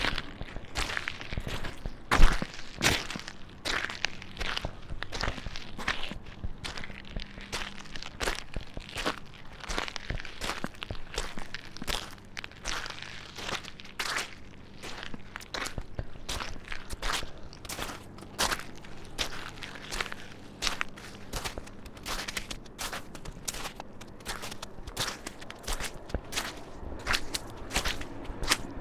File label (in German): schritte